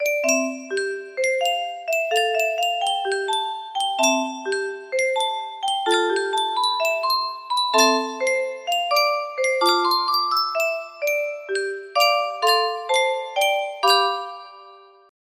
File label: Yunsheng music box